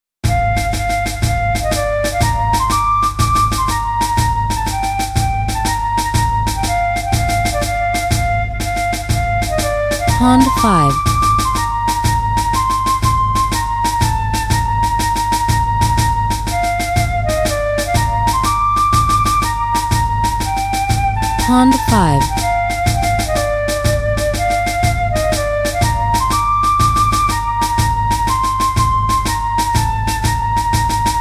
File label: Battle Hymn Republic Fife; Guns, Gore and Cannoli 2